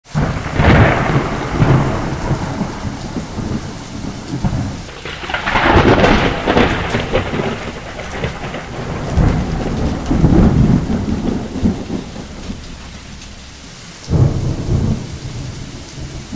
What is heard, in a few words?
Thunderstorm; The House of Silence Romance You Choose; android